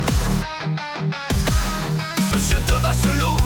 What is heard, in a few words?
jingle short